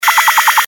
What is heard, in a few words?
good action; CAC ASSETS